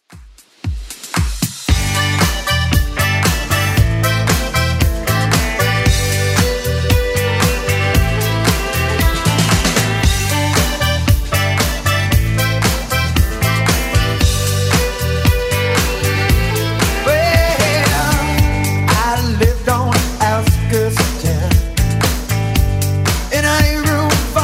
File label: 80s Club Edit 115 bpm; remixplanet